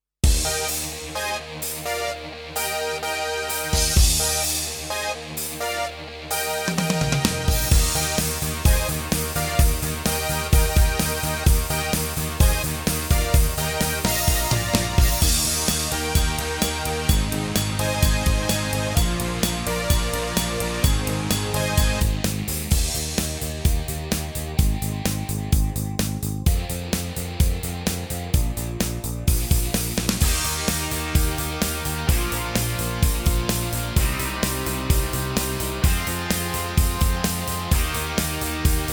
Roland EA7 American Rock

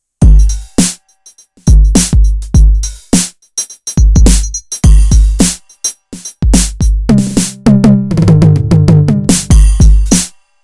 Roland TD-4KP

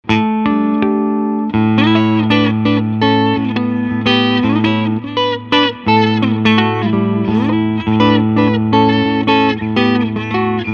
clean bluesy

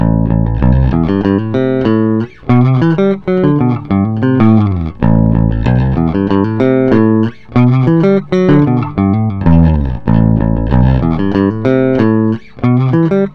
full gain

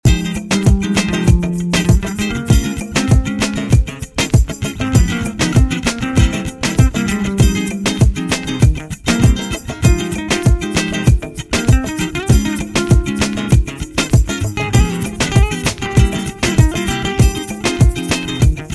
Fm Passionate

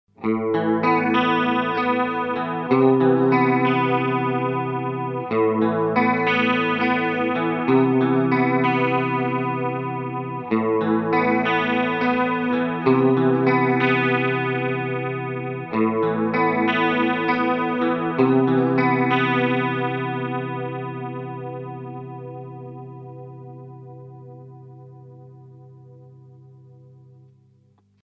Chorus Sound A

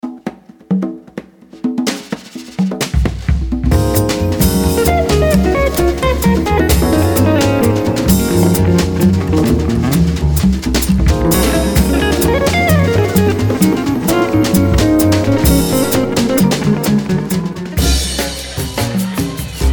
Nu Jazz City

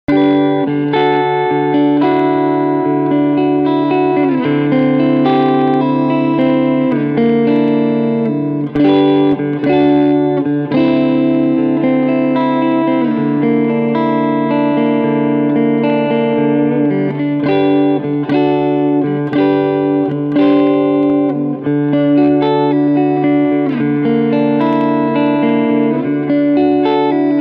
Cleansound Neck EMG

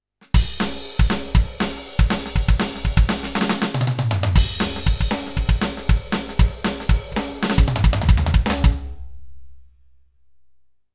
Roland TD07 Metal

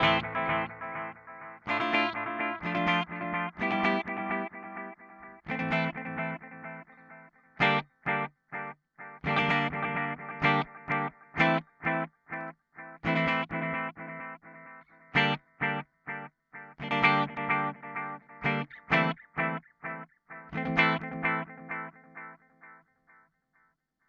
Analog Delay; Boss DD8

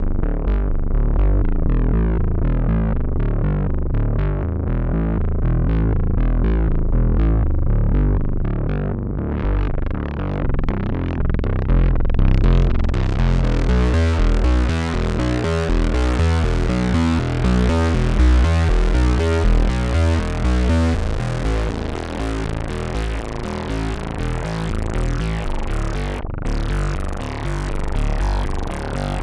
Xils PolyKB3 Bass Semblence

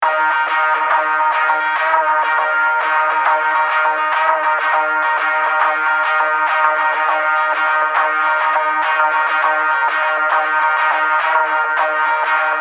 git OUT

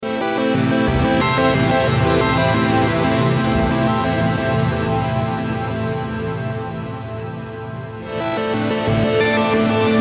Mooer GE 300

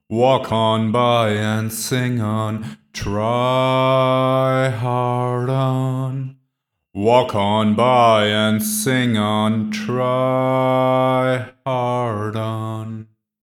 vocal phrase, gate comp, plate

